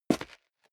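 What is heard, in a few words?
step leather shoes concrete grit single left, footsteps; schritte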